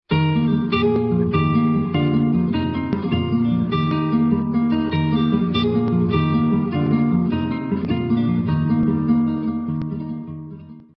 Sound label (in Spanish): guitarra; tradiberia